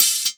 Hihat

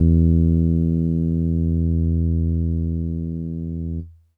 E Acoustic DX100 E1

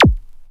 Prodigy Laser Perc Vinyl Synths C3

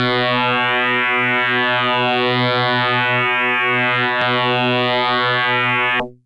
Electric Bass DX100 B2

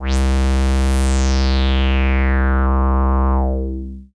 BASS

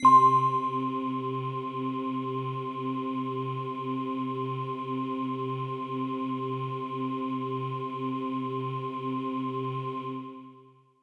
Bell Air Kawaii C3

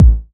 KICK

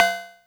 Cowbell FX

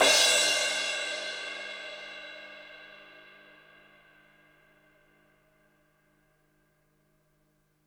Crash Acoustic Hard

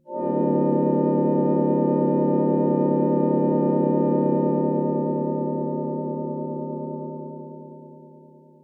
PAD Scifi Plains

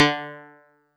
OldBanjo DX100 D3

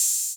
OH 808 Tape Decay, Open HH; Clean

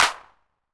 Clap 808 Color, Hand Clap

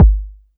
BD

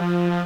No Regular Horn Trumpet F2

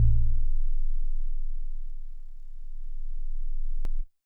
House Organ DX100 D0

